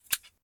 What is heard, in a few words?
Snip